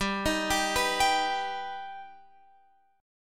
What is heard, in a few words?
G Augmented G Guitar Open F; G+ arpeggiated; JGuitar